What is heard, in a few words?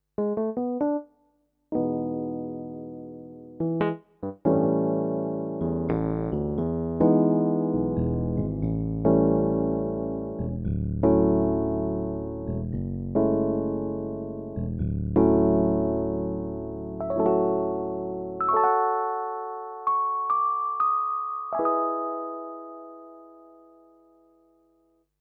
E Piano